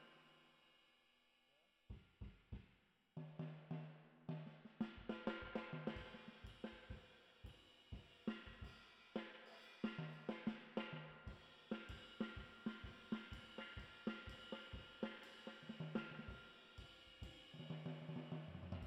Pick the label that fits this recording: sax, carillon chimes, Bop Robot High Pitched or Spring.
Spring